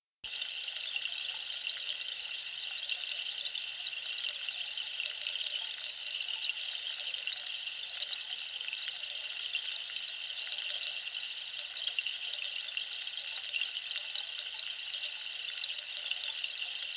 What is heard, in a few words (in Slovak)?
javor, harmonizujúci dažďový stĺp 30 cm javor